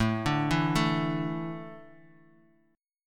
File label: A Suspended 4th; Asus4 arpeggiated; JGuitar